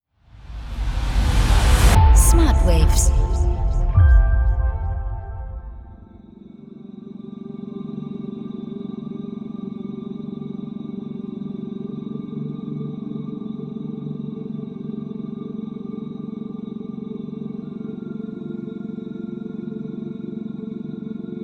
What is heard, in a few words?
Ambient Escape